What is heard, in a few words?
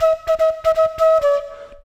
FLUTE ETHNO 125